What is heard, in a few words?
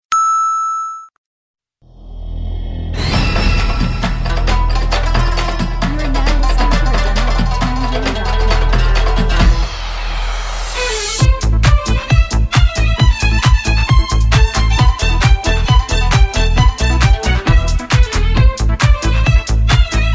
Turkish style